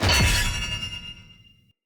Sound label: Short Sword Medium Parry Perfect Counter, Weapon Impacts; Player; Swords; Combat